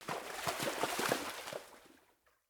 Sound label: Sit Spawn Fawn Run By Water Splash; Dendria